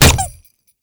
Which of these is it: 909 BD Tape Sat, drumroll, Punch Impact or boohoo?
Punch Impact